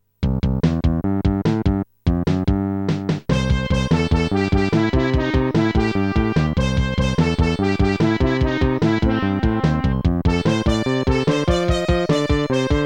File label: Fighting Grounds; Super Famicom Magazine Volume 12